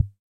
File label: boom; drum